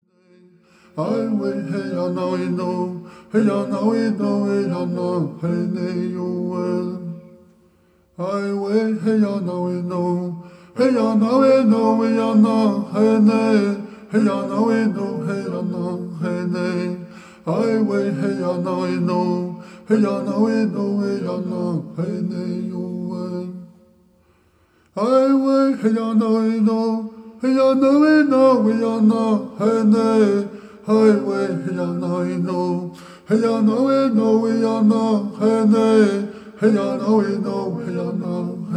Straight Song

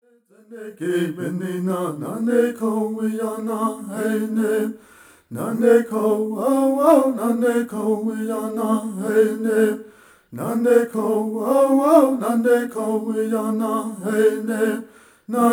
Dineh Prayer Song

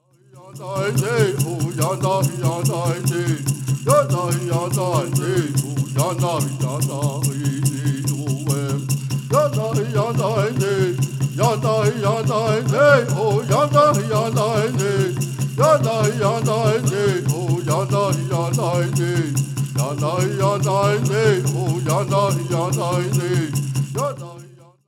Peyote Song

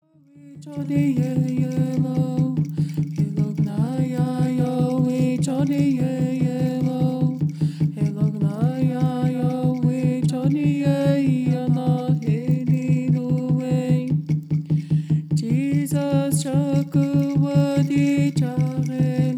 Peyote Song

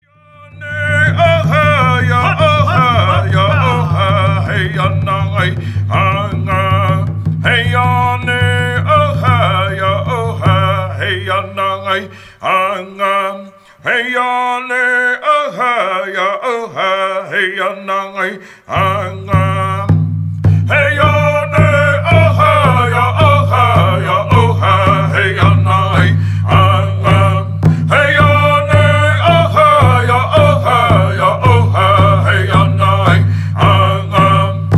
Victory Song

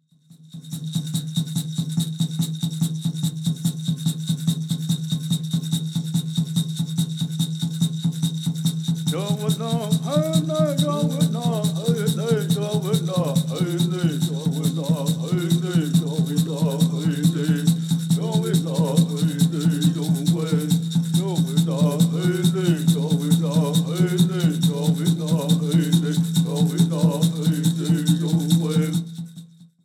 Peyote Song

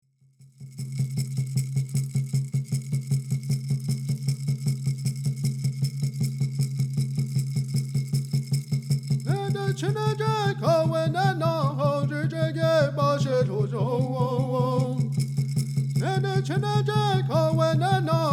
Prayer Song

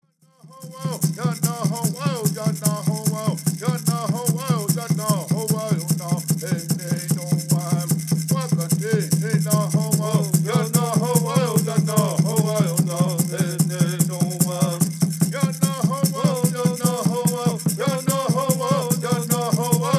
Peyote Song

